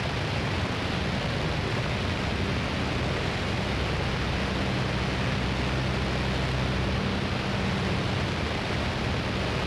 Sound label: thruster engine; platform thruster; Factorio